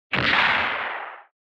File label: Retro Anime Gun High Short